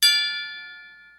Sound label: kling; liiga